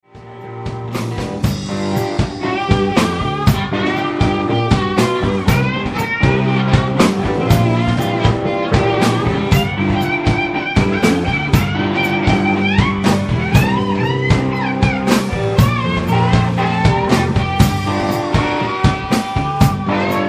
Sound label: Solo slide